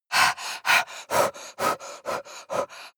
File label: breath female pant fast